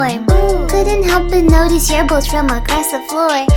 bad rap

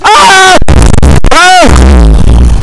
screaming meme

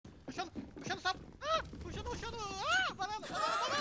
aaaa

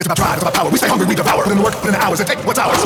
rap; The Rock

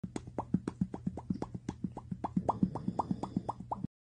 yea yea yea pop; pop cat

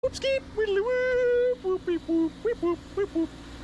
weep woop

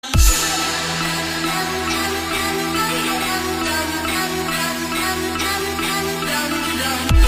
bass boosted

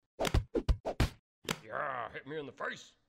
triple self slap

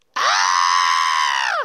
scream

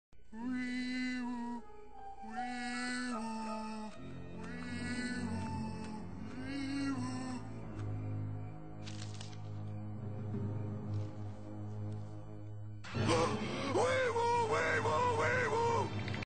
we woooooooo

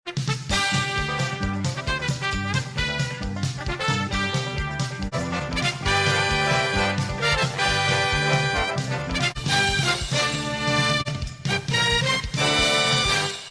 Theme Song Short US Version; Family Feud

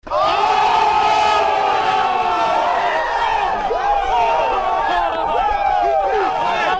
whooooooooooooooooooo

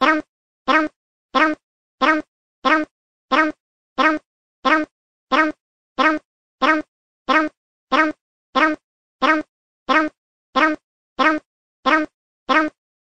lick sound loop; yoshi

